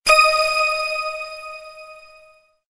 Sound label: Electronic Drop